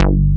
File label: PRO1